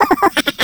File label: skelly small